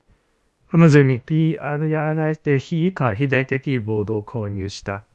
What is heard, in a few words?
amused